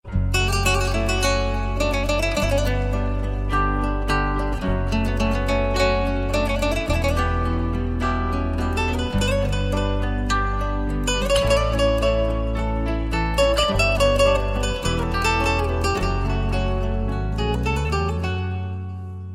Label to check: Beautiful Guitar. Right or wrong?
Right